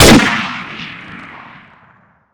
bar fire

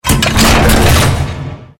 reload rocket; nod

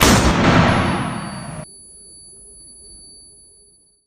flashbang explode

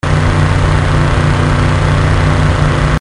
buggy idle; nod

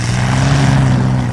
daimler start

